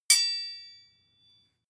sword hit ring soft

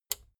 plastic button small latch push

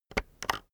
keyboard external enter press slow soft

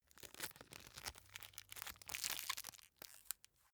package tape wad up

